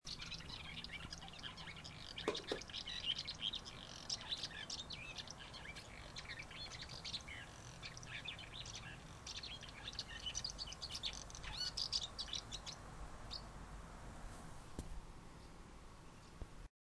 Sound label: swallows live at my new house they sound strange amazing